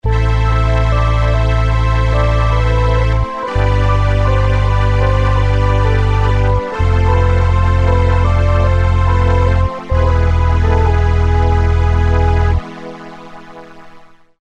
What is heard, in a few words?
Eminent 310